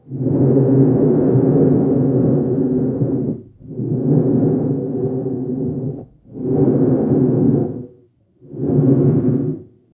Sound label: volcano eruption